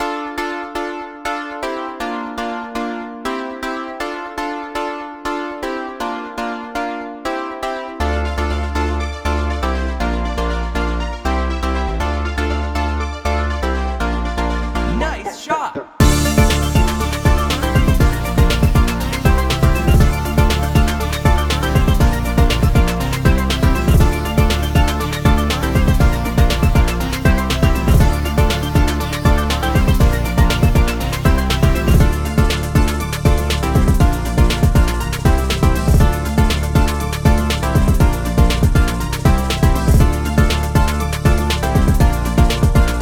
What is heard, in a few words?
Power Link (Instrumental); Friday Night Funkin' Vs. Shaggy x Matt